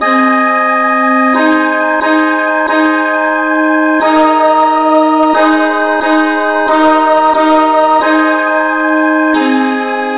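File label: no vocal key A flat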